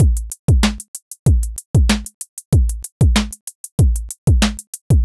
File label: 95 bpm boom bap drum loop 2 bars